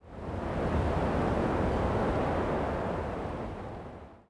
wind CALM; The Elder Scrolls III - Morrowind; Morrowind GOTY GOG

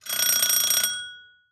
Household Doorbell Ring Old

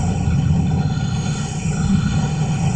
Ambient; Sotha

